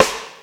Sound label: PROC.DRUMS; Crypton - DrumBase5000